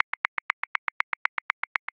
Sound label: Funk Modern 16ths, Grooves; Ableton Live 12 Lite; Core Library